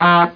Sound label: wrong; earmaster